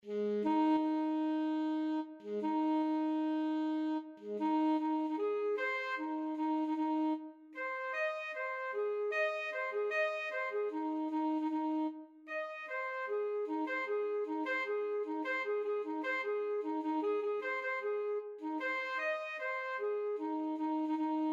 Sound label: last post infantry, saxophone